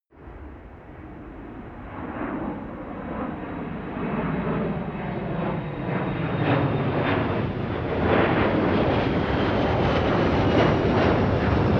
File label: plane incoming land; flugzeuge verkehrsflugzeuge; verkehr transport